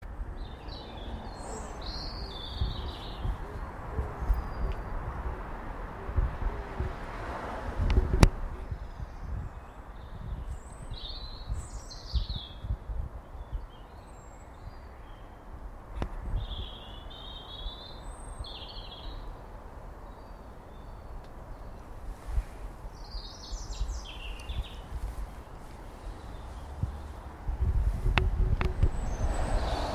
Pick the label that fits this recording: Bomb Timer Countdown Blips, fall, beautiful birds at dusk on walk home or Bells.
beautiful birds at dusk on walk home